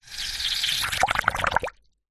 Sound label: food drink bubbles blown through straw into water in plastic cup; zapsplat